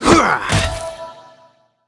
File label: Paladin M Skill